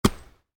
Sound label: Volleyball (Exterior): Block Hard; Sports; Miscellaneous Sports